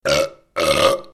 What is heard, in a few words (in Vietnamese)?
Tieng O Hoi